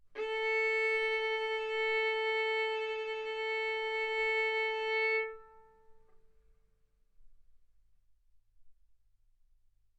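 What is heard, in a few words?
Violin arco mf A string A4 B4 stereo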